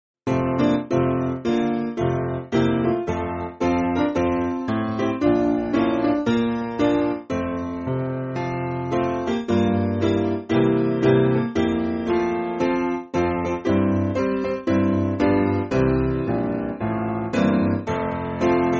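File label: Piano, Music Backing Files for Churches; I Must Needs Go Home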